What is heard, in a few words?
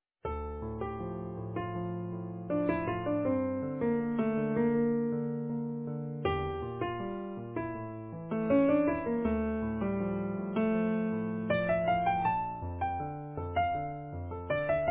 Easy Piano